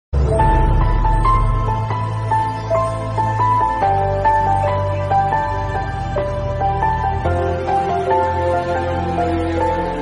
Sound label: instrumental piano ringtone telugu ringtone flute